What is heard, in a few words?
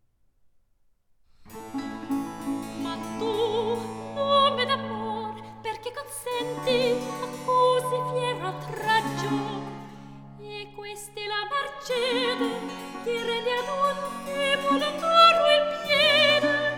Cantata Recitativo; Cantata RV 679; Che giova il sospirar, povero core; Recitativo II; Vivaldi; Bellezza Crudel; 2L